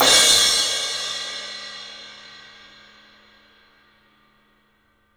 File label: CYMBALS; 3D Sound; 3D Drums